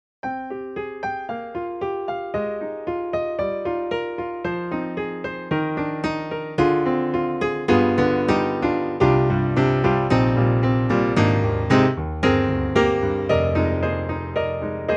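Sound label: forte grand piano; EDIROL; Super Quartet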